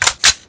m4a1 clip insert; items

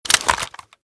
open, 9mmAR; weapons

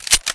crossbow mag in

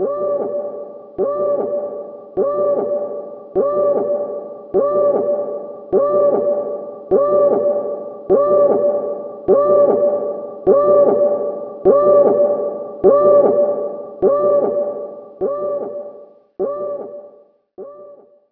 scanner alert pass; ambient; alarms

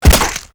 slicer, machines; ambient

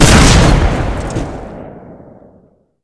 launch, headcrab canister; npc; env headcrabcanister

